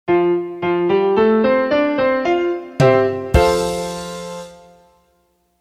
Establish F Major; McGraw-Hill; Establish a Key

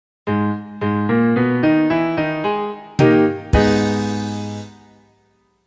Establish A-flat Minor; McGraw-Hill

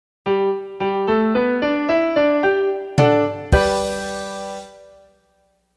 Establish G Major; McGraw-Hill; Establish a Key

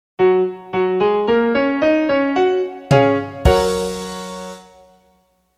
Establish F-sharp Major; McGraw-Hill; Establish a Key

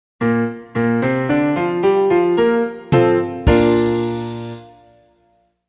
Establish B-flat Major; McGraw-Hill

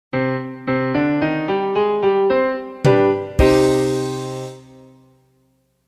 Establish C Minor; McGraw-Hill

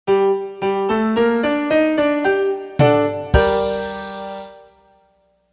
Establish G Minor; McGraw-Hill

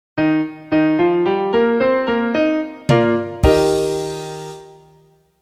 E-flat Major; McGraw-Hill; Establish a Key

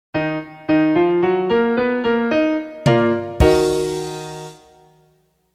Establish E-flat Minor; McGraw-Hill

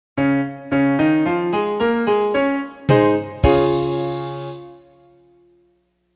Establish C-sharp Major; McGraw-Hill; Establish a Key

